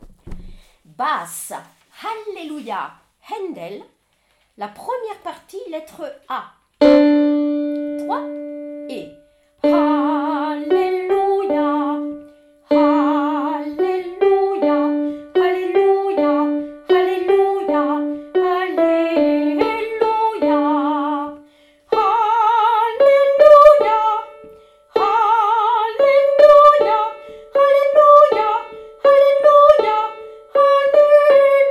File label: Hallelujah Basse; Handel